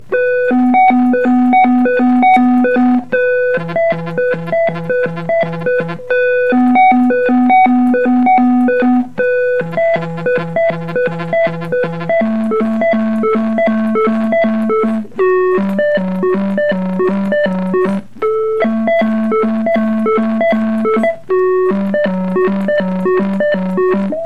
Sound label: siren song boom car